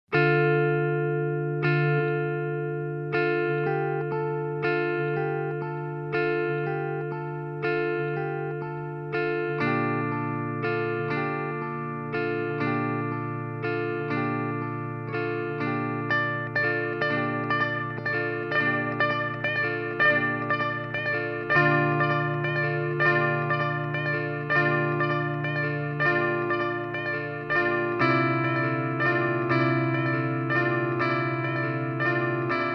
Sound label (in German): Echo Shifter Digital Maximale Delayzeit; Ibanez; ES3